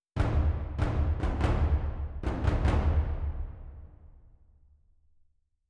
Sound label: taikos; Netease